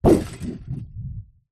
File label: impacts metal temp road sign hit wobble